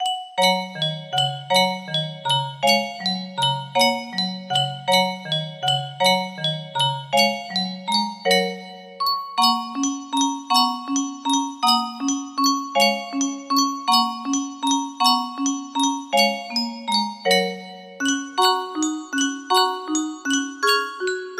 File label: lullaby, music box melody